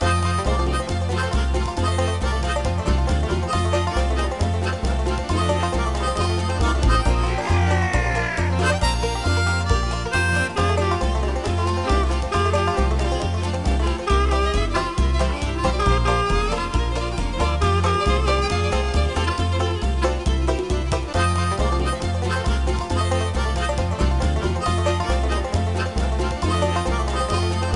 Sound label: Bonus; Extreme Hunting